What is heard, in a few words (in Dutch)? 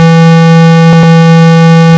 snaar 6E; tonen; The A- tune is a standard for instruments and orchestres in the most